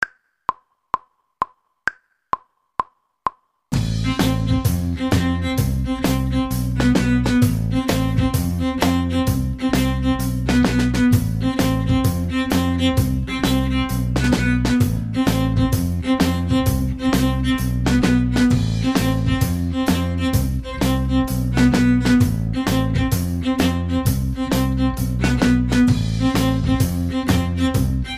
cello section

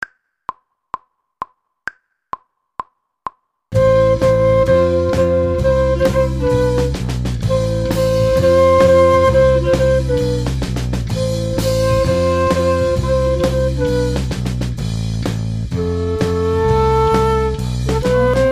flute

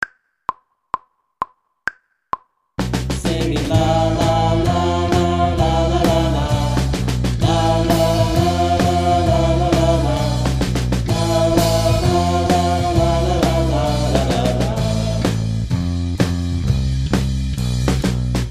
vocals

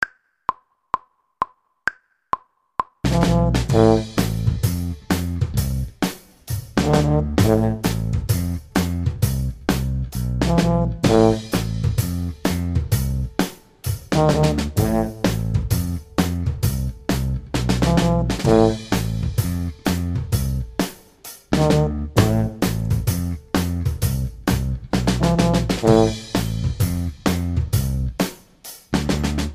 tuba section

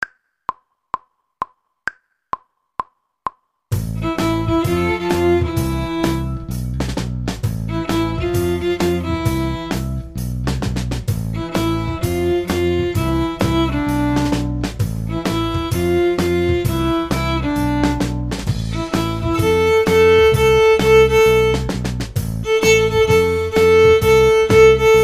viola

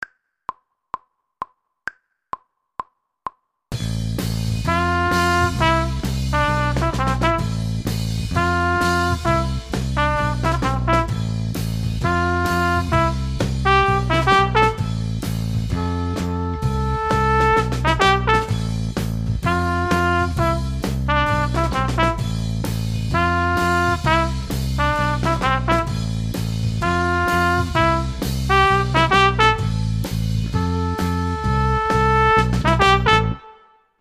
trumpet section

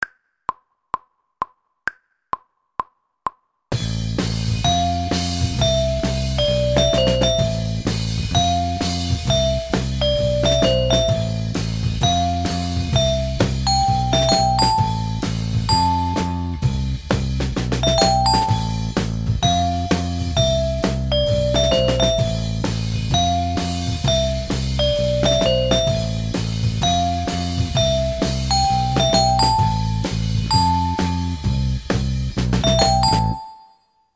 keyboard section

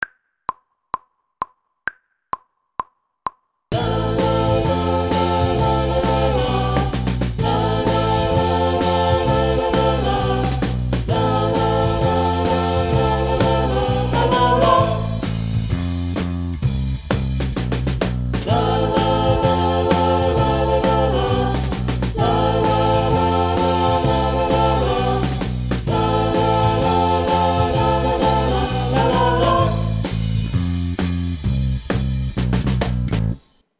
vocals

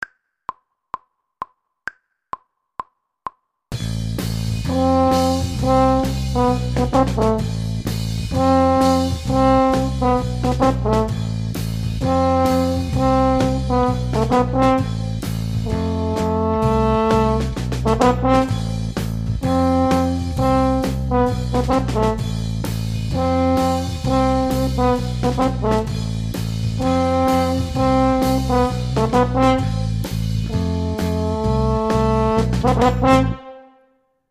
horn

